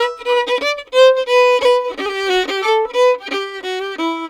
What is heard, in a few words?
violin, Celtic Flavours